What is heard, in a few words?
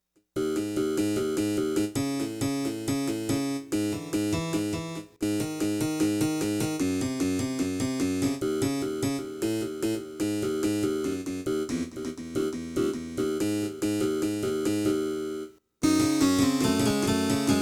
audio test recording keyboard aux pc mic; keyboard one fourth